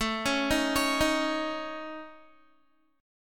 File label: A Major Flat 5th A Guitar, chord; AMb5 arpeggiated